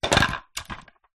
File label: household water sprayer bottle plastic small knock over garage floor some room; reverb